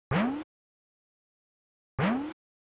Drum Loop 128BPM Solo Pitch FX; VEC5 Drumloops; VEC5 Multi Loops; Loop Elements

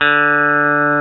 BB GUITAR D2; E-MU Formula 4000 Series Vol. 3; Analog Odyssey; VK Instruments

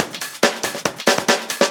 Break Beats, 140 BPM

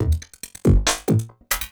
LOOP, 140 BPM, Drum & Bass

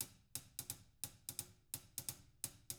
HIHAT LOOPS, Living Drums

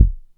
BD01, Electro-Harmonix DRM-32

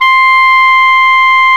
Clarinets

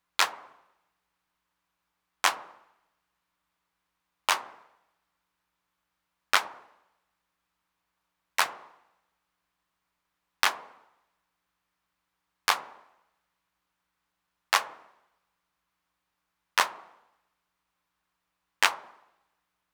clap both, Roland TR-909; Roland TR-909 Ableton Project

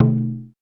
U220 FX Set, Drum Machines; Roland U220

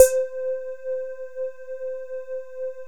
BOUNCE; Masterbits; Vintage Voltage; SQ+FX

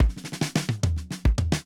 70 s Fill 108 bpm, drums; Basement drums vol 1

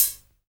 HH, HIHAT HIP; Best Service Dance Mega Drums

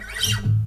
AC STSQRL, Guitar; Quantum Leap; Guitar & Bass

